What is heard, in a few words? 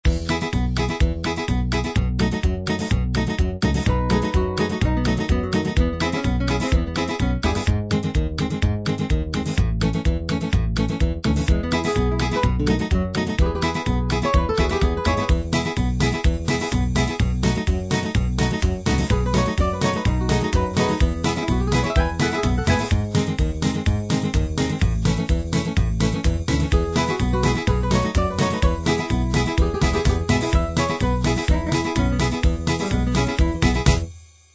licks